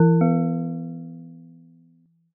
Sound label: china gong